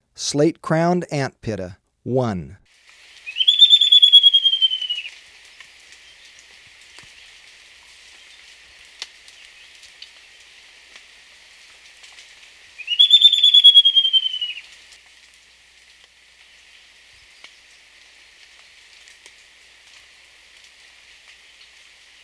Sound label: Slate-Crowned Antpitta, Birds of Ecuador; S. America